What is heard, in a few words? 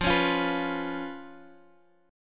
Db 7th F